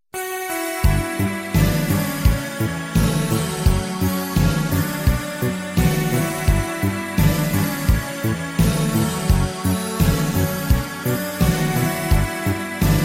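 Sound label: instrumental ringtone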